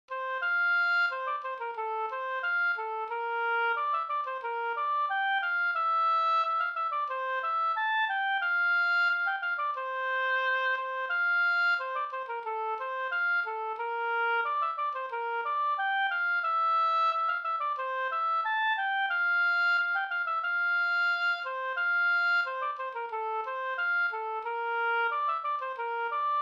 snoa

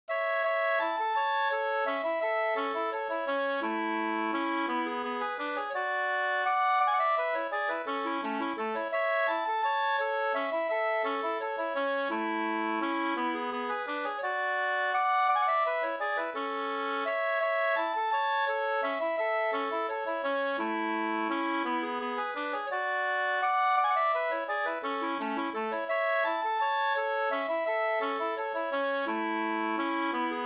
vals A-dur

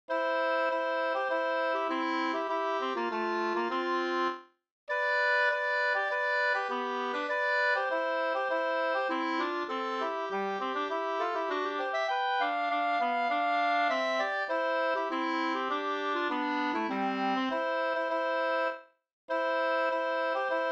brudmarsch C-dur

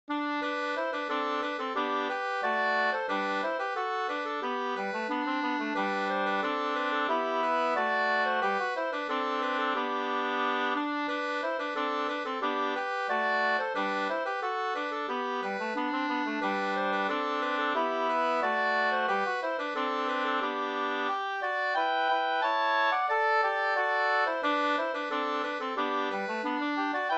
brudmarsch; fr Lillhardal

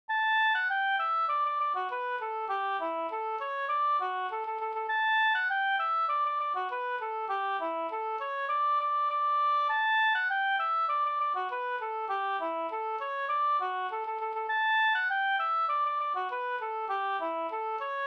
polka polkett

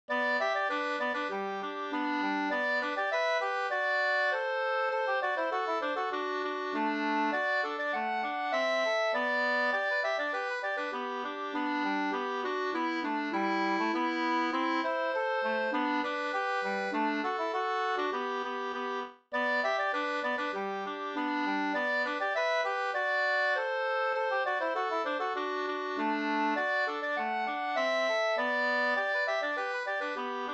polka, Pariserpolka, 2st

